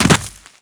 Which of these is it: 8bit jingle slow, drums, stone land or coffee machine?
stone land